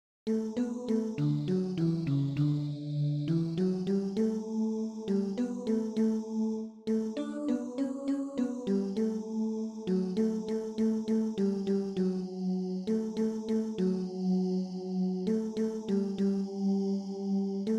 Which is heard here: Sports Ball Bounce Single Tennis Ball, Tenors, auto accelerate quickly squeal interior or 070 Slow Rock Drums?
Tenors